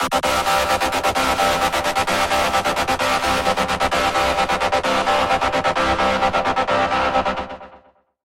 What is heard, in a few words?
Dance Arpeggio